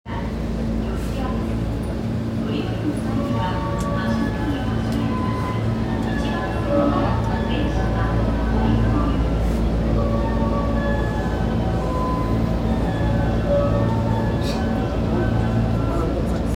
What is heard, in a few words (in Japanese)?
丸ノ内線四ツ谷西, train sounds